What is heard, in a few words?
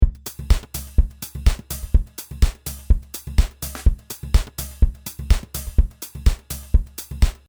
Prog House 125